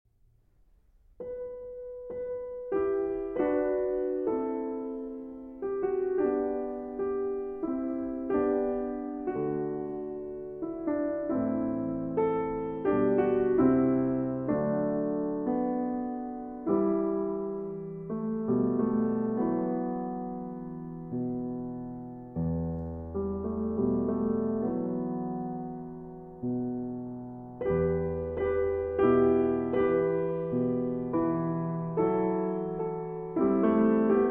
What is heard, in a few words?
Lullaby in E Minor